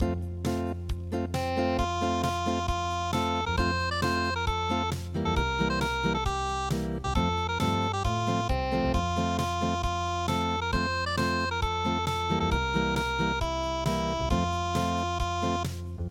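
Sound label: unplugged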